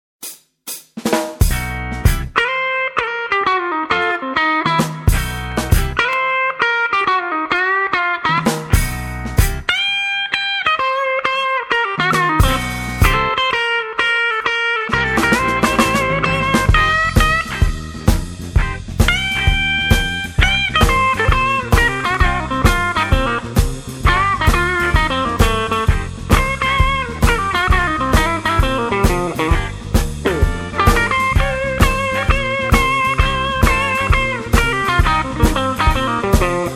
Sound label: blues jazz